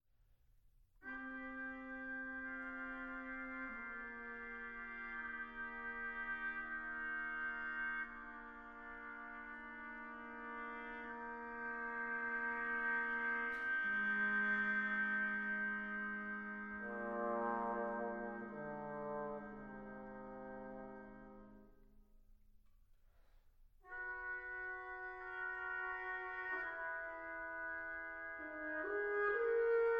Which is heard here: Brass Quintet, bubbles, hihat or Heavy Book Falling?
Brass Quintet